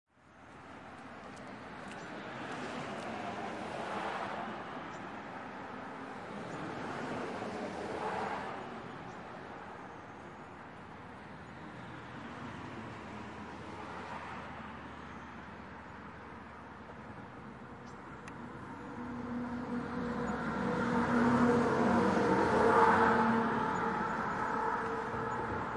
traffic ambience and fire engine with siren passes